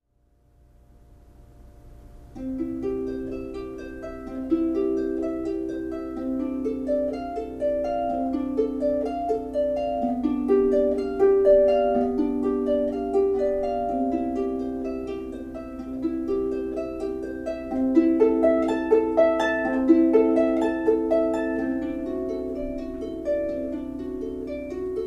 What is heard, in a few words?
Harp, Prelude in C